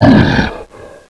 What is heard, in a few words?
pain; nemindungi